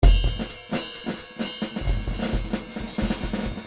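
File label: drums jazz pattern; Paul Kodish Dangerous Drums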